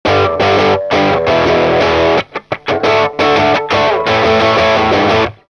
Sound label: Solo Punk Hot, Guitar Effects Pedals; Pro Co